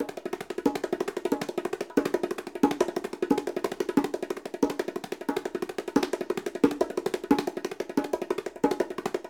combat loop; Holy Potatoes A Spy Story

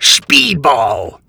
bon speed ball; pirates of the atlantic windows gamerip 2006

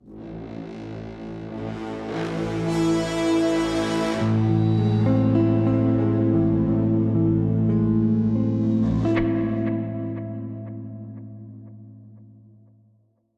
Sting Hyper Jump Mysterious

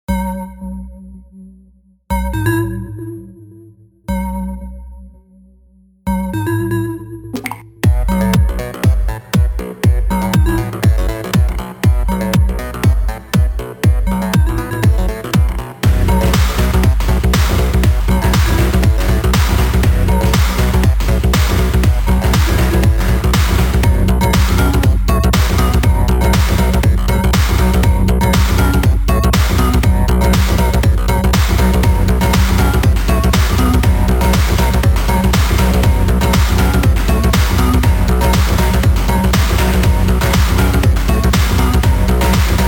Noisemaker; Futuregrind

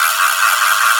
character ratchet; Birthday Party Bash; Wii

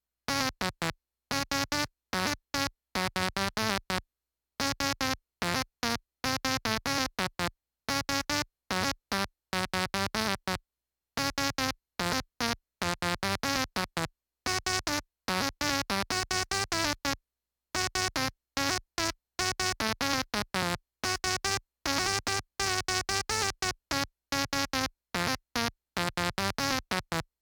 Melody; chippy

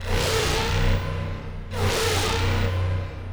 Seeker Alarm Red Loop; P.A.M.E.L.A.

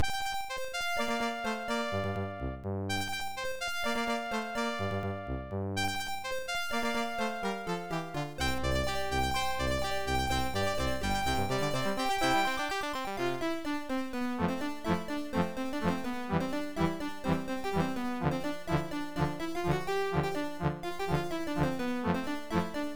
Exploration; Can Can Bunny Spirits; PC-98

